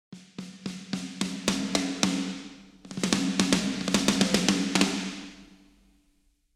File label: snares; frostbite orckings metal kit; klangmacht